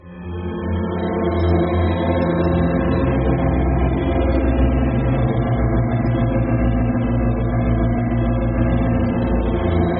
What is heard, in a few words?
unsettling low quality music